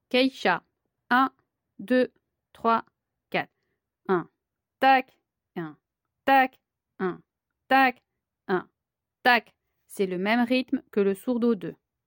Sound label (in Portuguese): caixa; Les rythmes de la batucada